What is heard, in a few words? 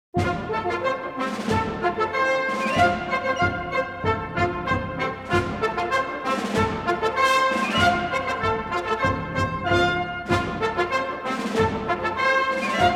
Wind Ensemble, March for Military Music in F Major